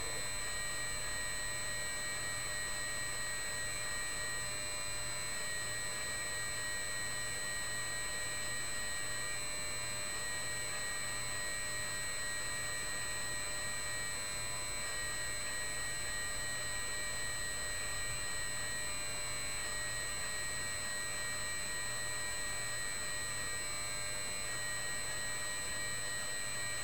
radio transmitter tone modulation projected onto wall